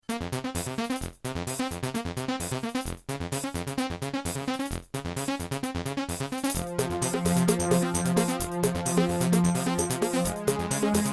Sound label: Terratec MiniWaveSystem TTWT; Descent - Level 1